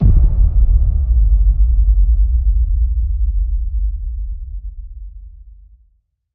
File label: Hit Low Fire The Cannon